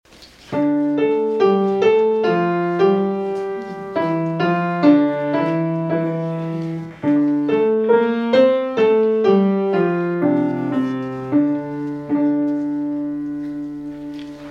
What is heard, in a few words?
hymn; Before the Cock Crew Twice